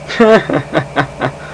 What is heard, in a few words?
sarcastic laugh beep; Short Miscellaneous